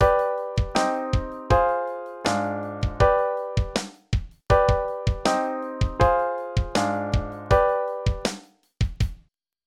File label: Chord Progression